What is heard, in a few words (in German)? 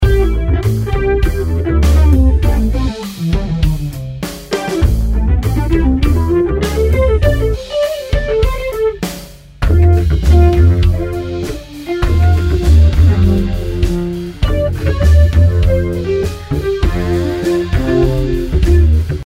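Gitarre, Bass; Waves Abbey Road Studios Reel ADT; SPL Attacker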